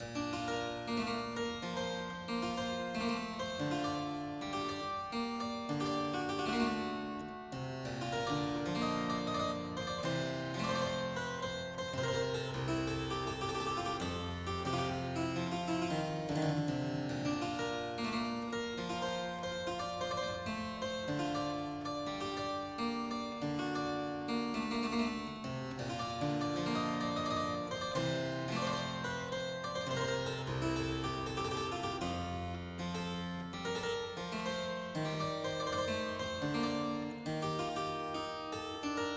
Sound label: Suite in C major Sarabande, Harpsichord